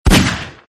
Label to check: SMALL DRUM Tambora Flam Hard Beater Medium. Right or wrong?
Wrong